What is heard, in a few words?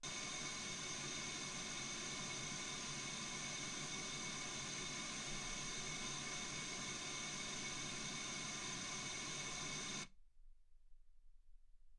wgn